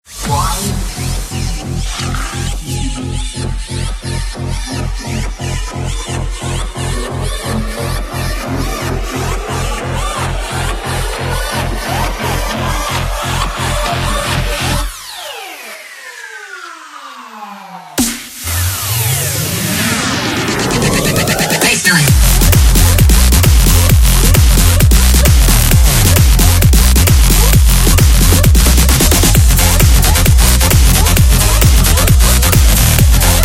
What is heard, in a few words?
Bass-Knight; dashy square